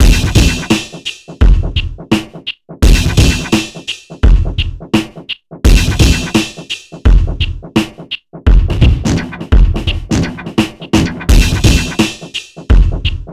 HIPHOP, Funky Xtreams; INIS

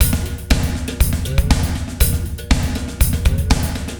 120 POWER, drums

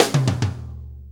4-4 Fill, Drum Loops

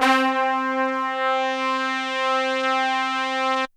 HORNS C3 R

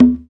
African Perc

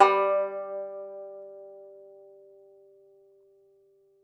Tenor Banjo, Plucked Strings

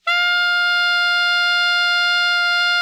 ALTO MF F 4, Sax; Greytsounds; Philip Wolfe David Crozier's Saxophones

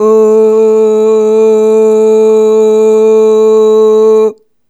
Ooh Long A2 Dry; Harmonic Building Blocks

